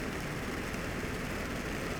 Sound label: pulses, BALAENOPTERA; MONACO 2022-07